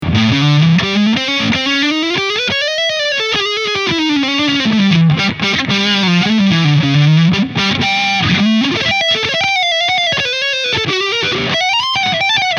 Peavey Invective MH Full Gain Leadsound Mid 9 Uhr

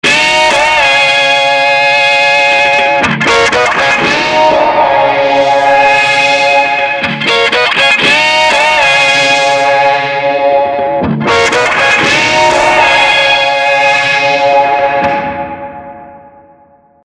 E-Gitarre; Dark And Dangerous

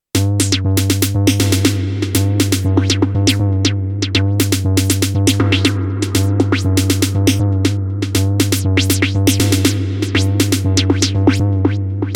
Elektron Sequenzer Parameter Lock LFO auf Pitch und Filter DEL REV

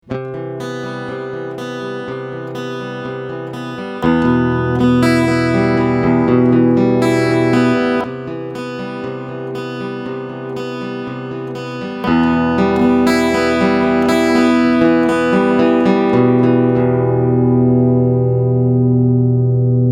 Boost 12 Uhr On Off

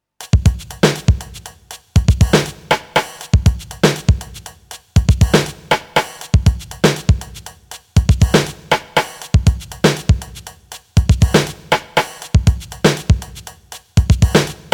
Drumtraks Groove mit Hall

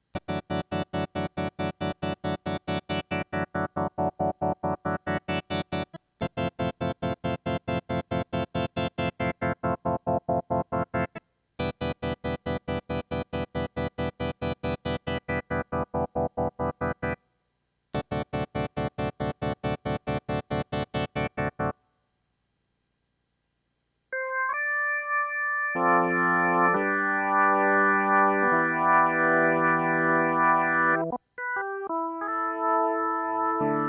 Orgel

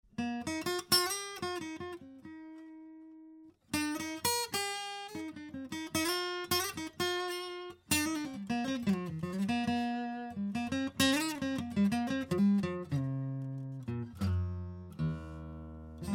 114ce C414 Melodien und Open Chords; LTD

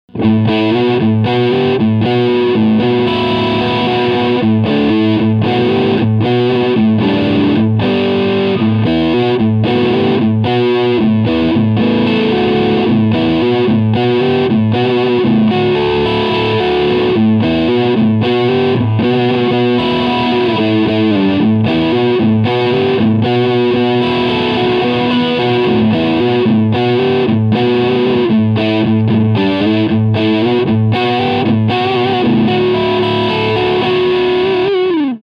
Jackson JS32Q DKA Dinky E Gitarre Crunch Sound beide Pickups

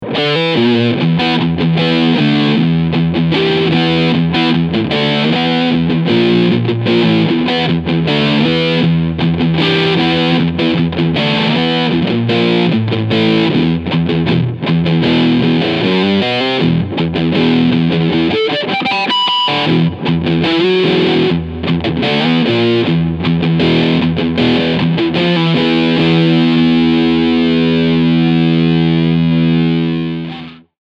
EarthQuaker Devices Hizumitas Sustain FULL Tone 12 Uhr Riff

